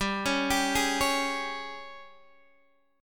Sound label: Ab Major 7th Suspended 4th G